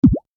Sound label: hover